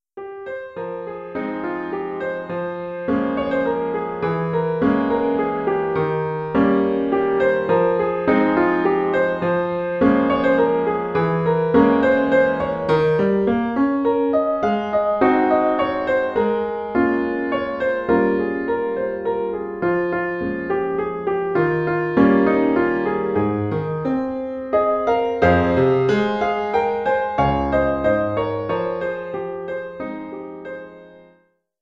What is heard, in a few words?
intermediate piano solo